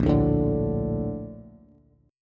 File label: Bb Major 7th, Bb, down, Bass; BbM7 strummed; JGuitar